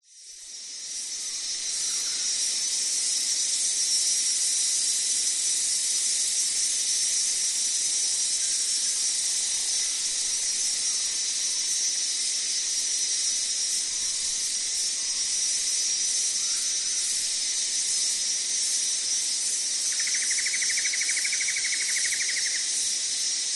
ambience rural insects flies cicada crickets distant birds wind in trees summer; glasshouse